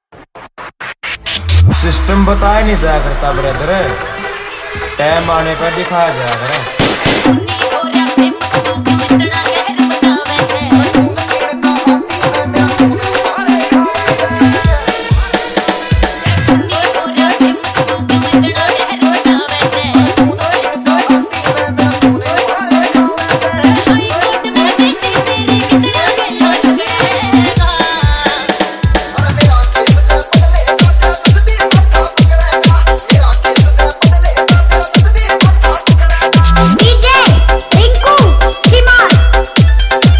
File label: Remix Haryanvi Song